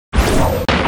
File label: darkness rain